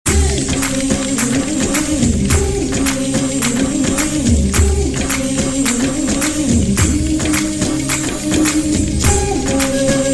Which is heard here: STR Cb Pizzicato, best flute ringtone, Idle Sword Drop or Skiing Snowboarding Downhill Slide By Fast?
best flute ringtone